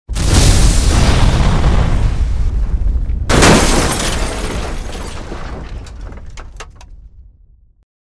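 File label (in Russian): двойной взрыв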